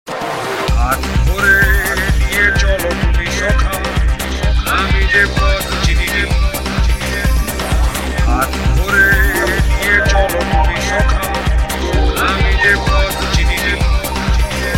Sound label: bangla mix